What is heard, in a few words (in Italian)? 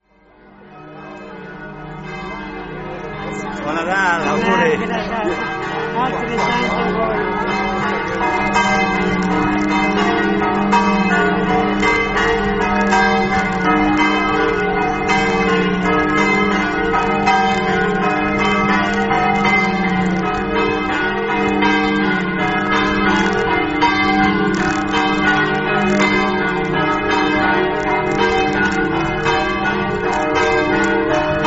campane; manzano